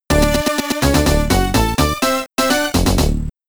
VS Victory; Dyna Blaster; amiga

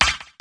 down test

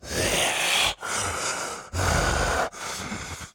Walk Cycle Burns; Devour; The Town

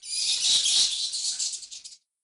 bats overlay; adams venture origins

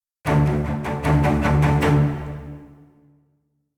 Combat Out; Skellige

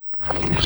Reverse Melt

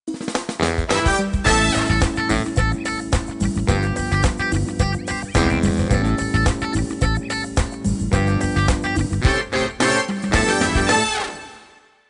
Disco Funk; Toy Story 3 The Video Game; Nintendo Wii

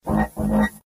Signal Jammer; gamerip

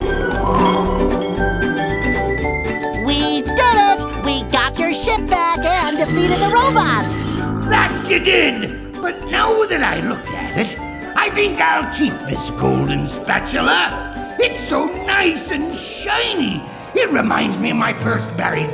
Slowed; SpongeBob SquarePants Battle for Bikini Bottom Rehydrated

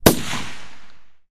Ambience Gun Shot

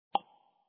basic Shoot; Bubble Tanks Tower Defense

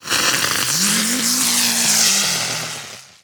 Fireworks Wheel Launch; angry birds rio

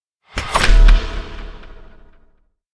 card fired

